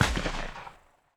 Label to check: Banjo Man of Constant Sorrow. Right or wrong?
Wrong